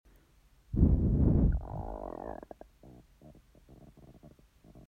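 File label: Gurgling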